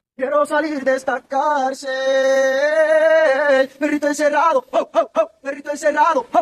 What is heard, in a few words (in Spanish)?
perrito encerrado